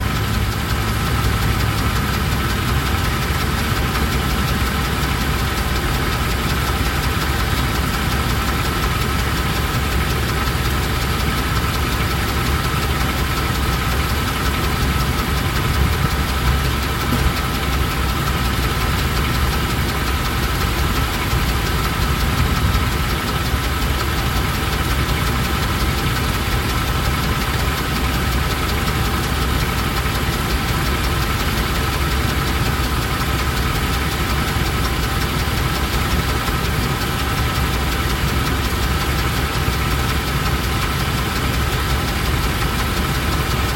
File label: disco hissing sound; discovery ii